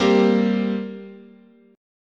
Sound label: G Minor Double Flat 5th, guitar chord